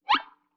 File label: Violin Short Pitch Slide; Vintage Cartoon; Musical Elements